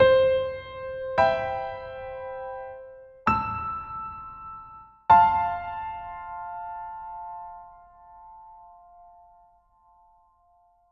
piano candy drops

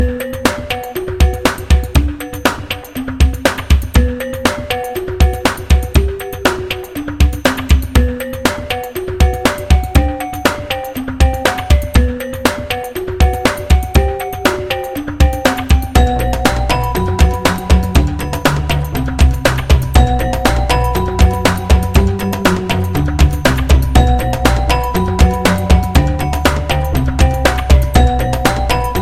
gamelan remix

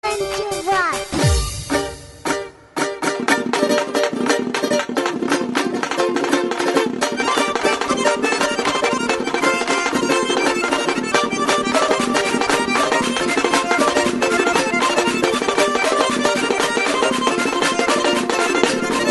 lezginka

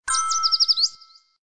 chirp; twitterriffic

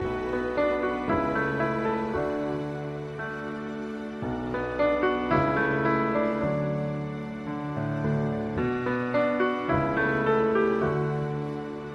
piano ringtone

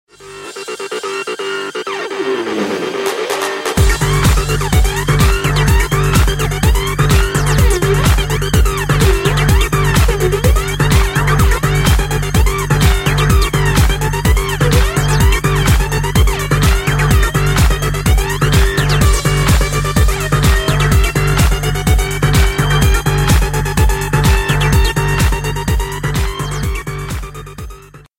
best dj beat

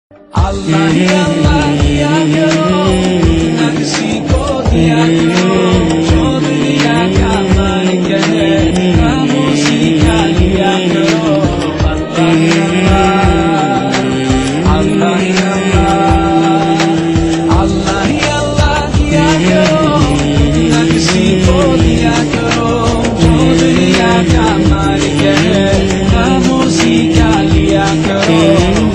islamic ringtone

